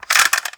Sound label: Cassette Rattle